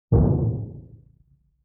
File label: Timpani Hit; Vintage Cartoon; Drums & Percussion